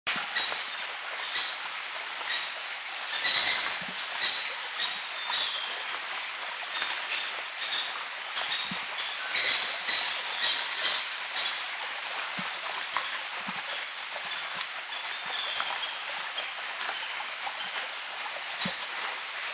Maroon Woodpecker; Thailand; Krung Ching

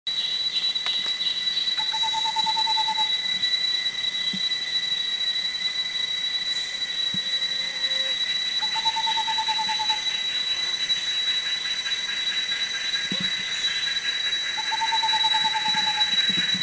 Chestnut-winged Babbler

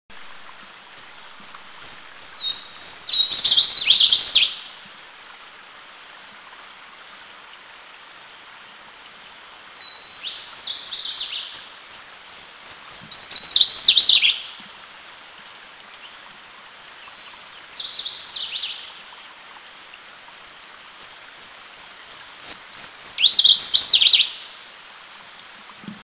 Slaty-bellied Tesia; Eaglenest; India

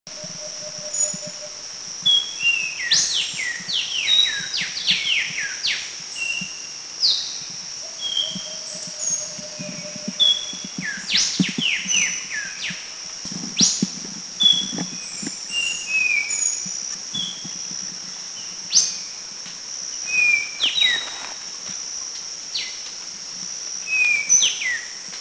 Yellow-bellied Whistler Bohol

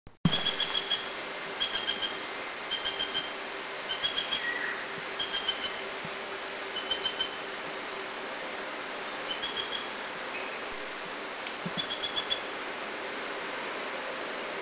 Greater Flameback Subic; spp. haematribon, 3 seen Subic

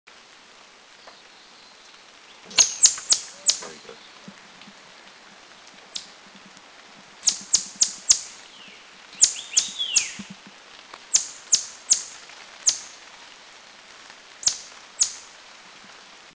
Swinhoes Pheasant, Yushan, Taiwan; Swinhoe's Pheasant Lophura swinhoii Near-threatened (NT) Country endemic

